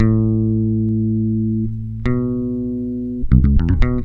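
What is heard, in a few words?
bass, right; USB Soundscan vol.32; AKAI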